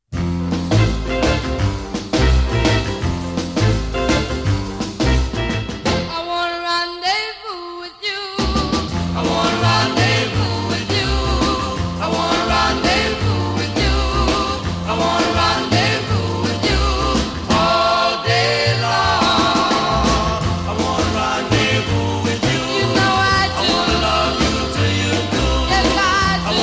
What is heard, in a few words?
doowop sounds